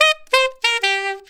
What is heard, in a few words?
W-Coast HipHop Sax Eb, Hip Hop